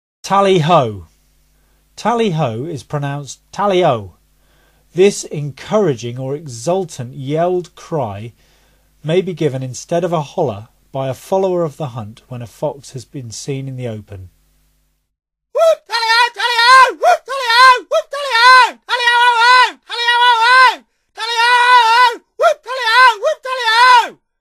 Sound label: "tally-ho", calls